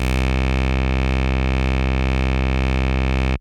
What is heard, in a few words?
Synth C, Synth Prophet One Shots; Electronisounds